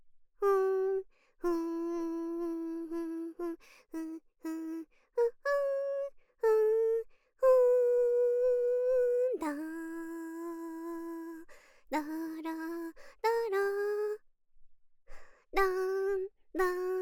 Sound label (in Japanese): メインテーマ